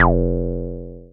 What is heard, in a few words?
Synths BASS